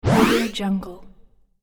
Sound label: expand element